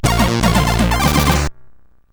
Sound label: Die Hard, CSG8580R5